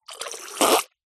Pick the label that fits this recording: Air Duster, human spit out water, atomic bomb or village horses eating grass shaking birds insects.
human spit out water